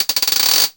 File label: guiro, synth guiros; Zero-G Ambient 1